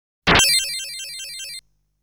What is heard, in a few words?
Treasure change sound acquisition sound; legend of hero tonma